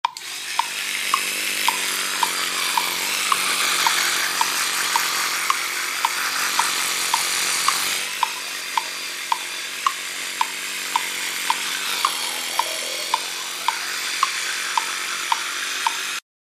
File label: Ticking tootbrush